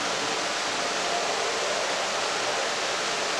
bg Atmos

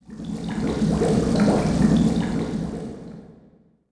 PIPES, pipes; zones

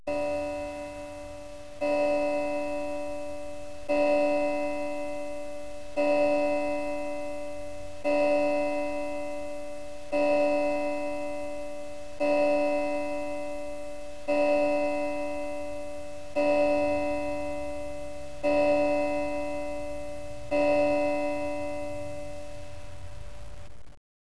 CHIMES

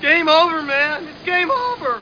exit Windows

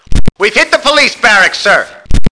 POLICE; APACHE

